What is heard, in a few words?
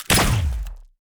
tower rocket Gunners phosphoric Coating